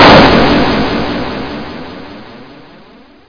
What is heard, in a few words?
logo blast; Eat My Photons!